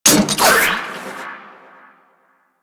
Harpoon Gun Charged Mech; Warframe; Sharkwing; Grineer

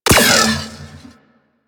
Verglas Shot, weapons; DE; Warframe; Sentinel

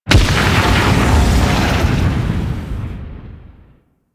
Tornado Impact; Warframe; Tengu

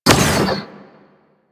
Sentient Ghost Cannon Fire; Warframe; DE; Sentients; Sentient Eidolon Ghost Caster; Weapon

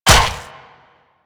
step default; Warframe; Infested; Zealoid Prelate

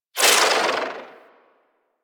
Rifle Projectile Tail; Weapons; Warframe; Corpus; Crp Ruban Rifle

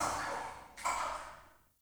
Cave Ocean Creature Close; Ambience; Midrath Island

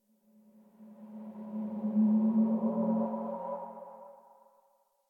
Backwards Whisper Yell; Warframe; Ambience; Entrati

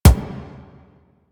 Weapon Punch Pistol; Warframe; Weapons; Pistols

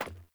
Sandals Run Metal, Footsteps; Characters; Player

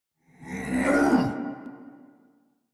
Attack Effort; Warframe; Dax Heavy; en

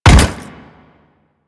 Step Default; Leto

